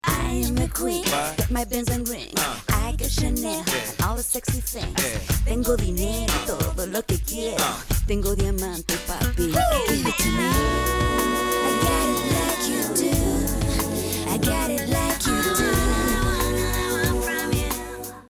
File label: Caribbean Rap